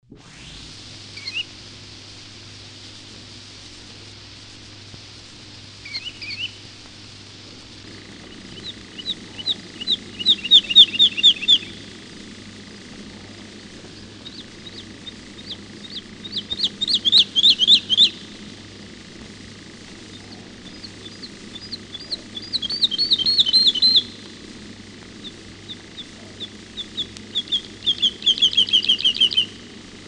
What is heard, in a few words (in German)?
Heidelerche; Vogel; hoerstation vogel wg platte 2